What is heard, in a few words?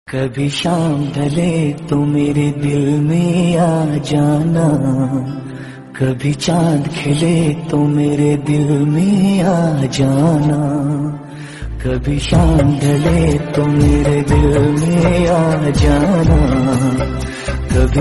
Lofi Version Ringtone